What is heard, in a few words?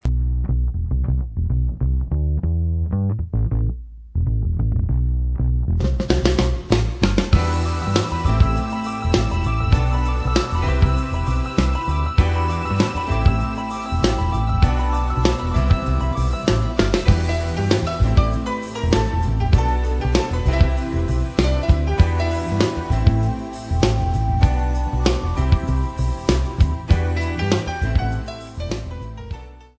jazz